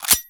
Hand Shotgun Reload, Weapons; Hand Shotgun Prime

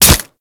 Kubrow Melee Impact Flesh

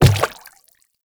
Quadruped Medium Walk Water, Footsteps

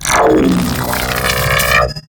EMP Blast Charge Up; Enemies; Weapons; Warframe; Arachnoid Camper

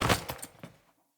Grunt Foley Body Fall; Enemy; Sinecure; Melee Grunt

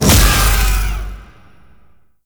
Incarnon Void Beam Start; Weapons; Warframe; Duviri; Incarnon Adapters

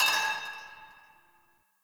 Pipe Cement Drop

